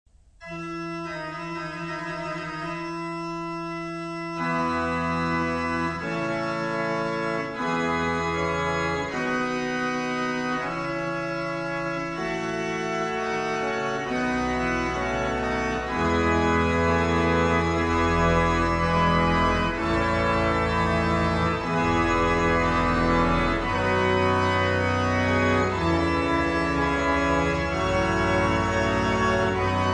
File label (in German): Praetorius Plenum kurz, Orgel